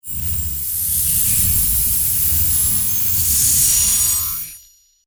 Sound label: Electric, Discharge, Magnetic, Complex, Scifi; 5.1; BlastWave